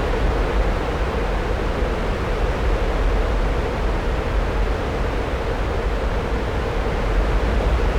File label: room tone; anvil